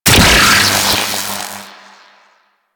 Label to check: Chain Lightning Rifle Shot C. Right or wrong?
Right